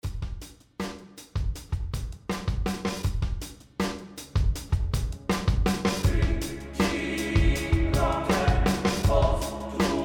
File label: Pop Choir Vox